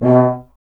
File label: tuba; Miroslav Vitous - Solo Instruments VOL-2